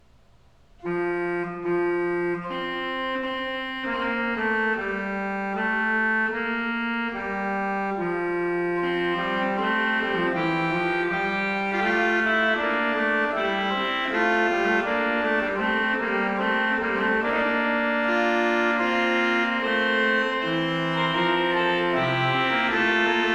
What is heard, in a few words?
Chorale Prelude, Organ; ''In dich hab' ich gehoffet, Herr''; Johann Pachelbel; Complete Organ Works